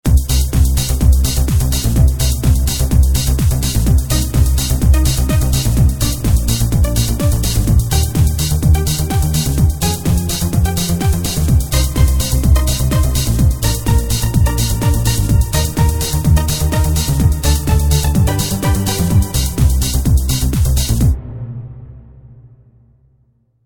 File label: Ultimate Ana Pizz, KORG